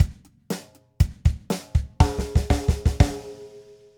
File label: subdivision triplet fill